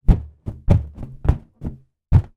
balloon inflated handling; zapsplat

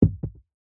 apple drop on carpet; food; zapsplat

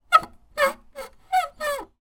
wood squeak turn; foley; zapsplat

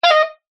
Sax Notes

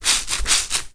sponge or cloth wet soggy squeeze squelch; household

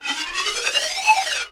pan pipe blow; musical

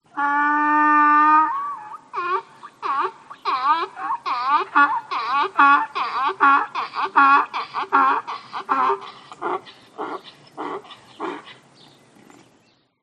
Donkey; audio hero

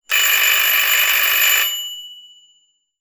doorbell old fashioned ring burst

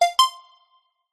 multimedia game sound synth digital two tone beep

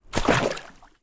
swimming pool leaf net pole dip into water

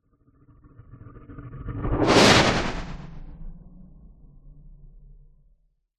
sound design sweep growl into metallic whoosh; zapsplat

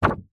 foley paper laminated sheet single short shake

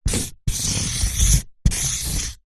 office pen marker large draw on cardboard

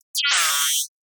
Alarm Loop; little robot sound factory

